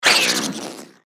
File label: giant rat chase vox; npc